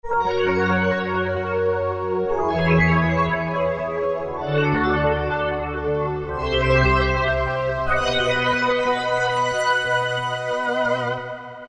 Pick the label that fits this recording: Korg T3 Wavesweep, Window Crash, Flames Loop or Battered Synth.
Korg T3 Wavesweep